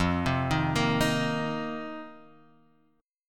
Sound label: C Minor 7th F; Cm7/F arpeggiated; JGuitar